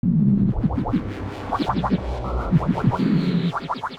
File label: Synth Sting; cinematic drama; Stings; musicradar